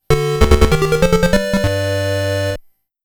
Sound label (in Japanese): レベルアップ ファンファーレ